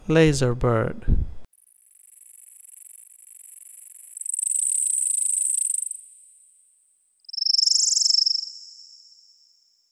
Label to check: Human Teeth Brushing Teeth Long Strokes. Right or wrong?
Wrong